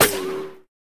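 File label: ricochet, guns hits; Weapons; civ13; civ14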